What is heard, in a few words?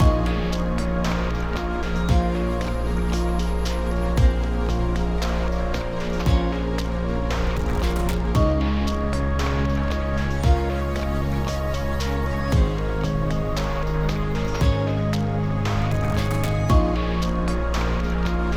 Exploration 57 5BPM